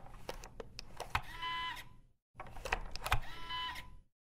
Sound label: Prison laser door